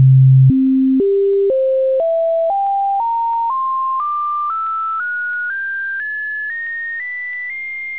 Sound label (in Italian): suoni armonici